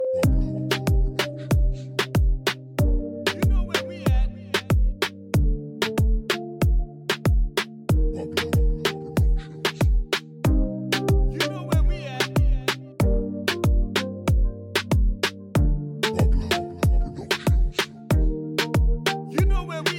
Latin Reggaeton

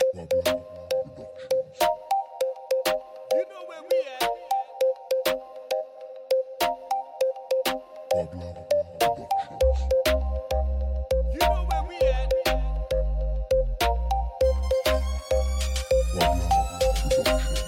Reggae Dancehall

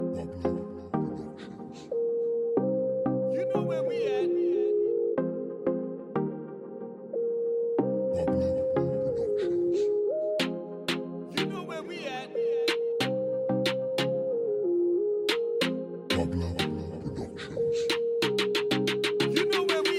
Reggae Dancehall

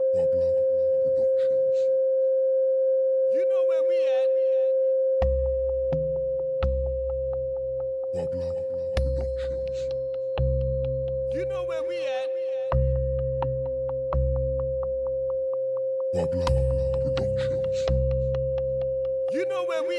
Reggae Dancehall

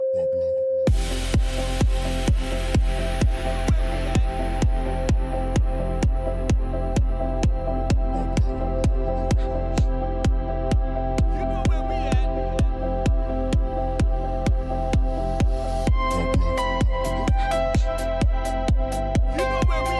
POP EDM

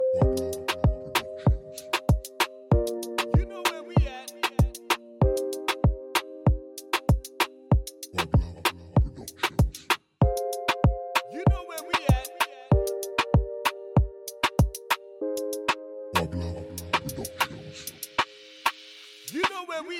Latin Reggaeton

